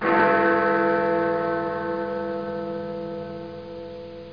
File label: BIG CHIME